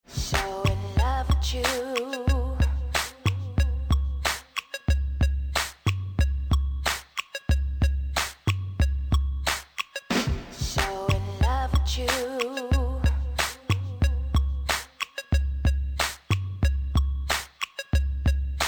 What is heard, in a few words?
loop